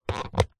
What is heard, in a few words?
household dustpan brush plastic unclip from pan; zapsplat